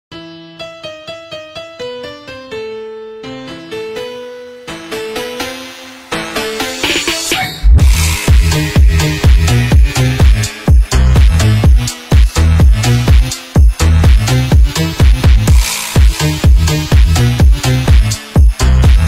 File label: CLASSICAL PHONK REMIX Bass Boosted; BEETHOVEN